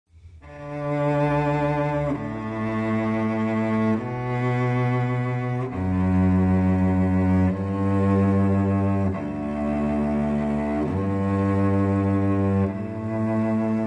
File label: Quartet Canon